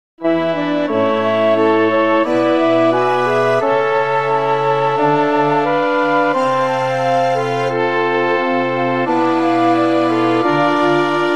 ORCH

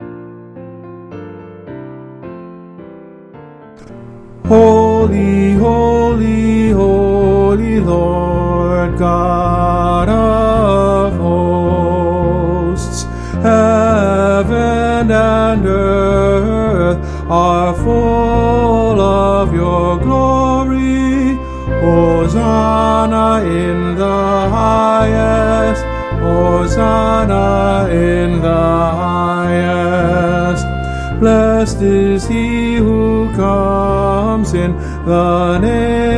Community Alto

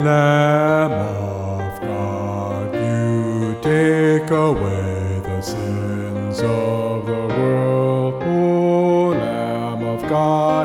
Bass

